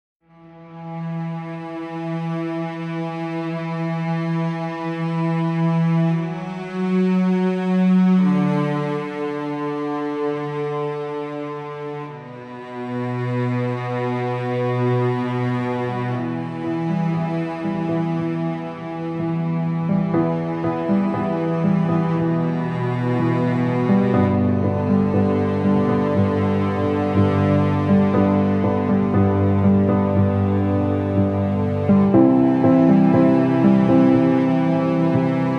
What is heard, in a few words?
soundtracks, 60 bpm